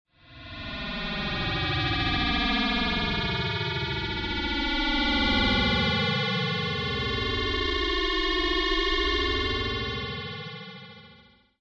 Starship Taking Off And Flying By, Sound of a starship, alien craft, UFO; Sound of a starship, alien craft, UFO or flying saucer taking off or passing by; Science Fiction Sound Effects; Lynne Music